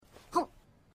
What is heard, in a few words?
anime girl eating